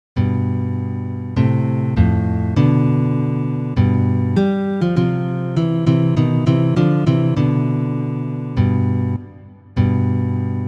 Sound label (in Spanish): ejercicios; aguado dionisio